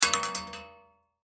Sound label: Bullet Shell; Bullet Shell 12 Gauge Drop Metal Catwalk; Drops (Sound Effects:Objects); Blastwave; Warfare